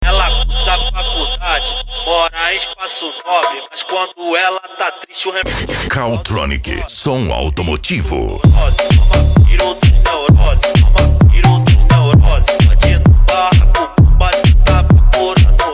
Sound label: mega funk e funk mega bass